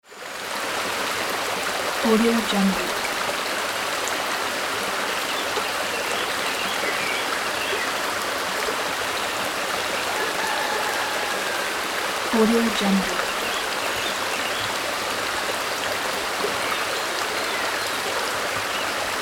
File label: forest river